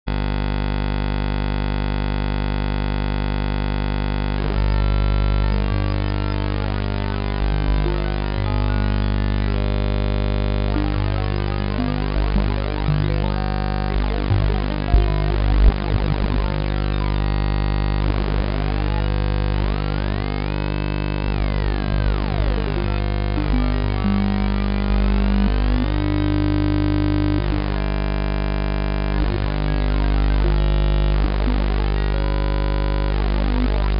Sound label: Generic Additive Synthesis; Julian Rohrhuber and Juan Sebastián Lach Lau